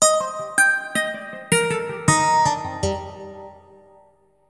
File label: ELECGUIT, AMBNT, 160, C; eJay; Ambient; Guitar